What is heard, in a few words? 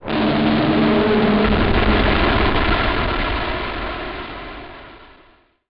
Transition Distortion Explosion; Production Element Title Transition